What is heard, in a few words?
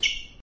sneaker; nickelodeon basketball stars 2015